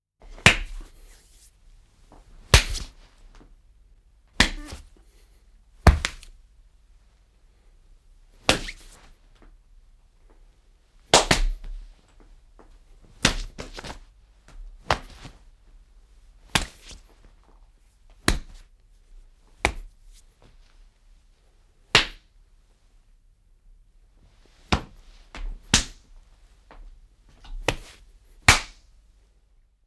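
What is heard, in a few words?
Blows Strikes Punches Lyöntejä Iskuja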